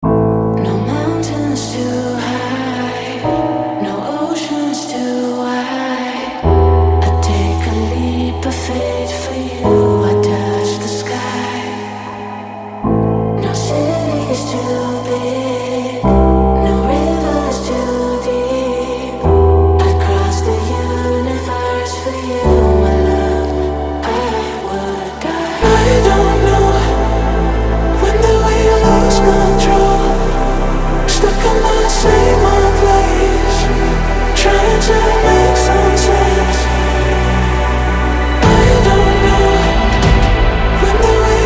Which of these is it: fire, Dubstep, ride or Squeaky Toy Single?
Dubstep